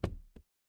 footsteps single

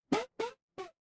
retro game jump

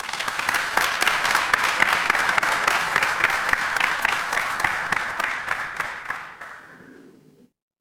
crowd applause

